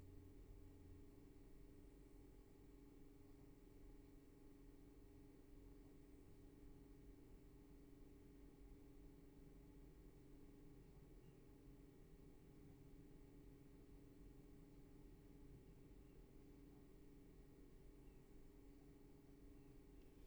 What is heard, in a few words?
roomtone